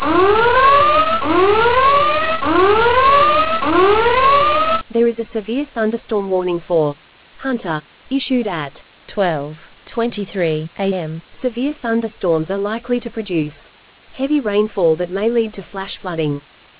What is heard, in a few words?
storm audio